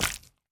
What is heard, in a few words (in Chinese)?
蜂蜜块 破坏声